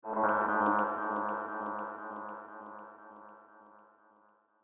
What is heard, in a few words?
Pure Fear Pulse Echo; Noise Music; horror